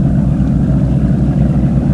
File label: pt boat move; 1997 MMX LAN PACK (win 95); Army Men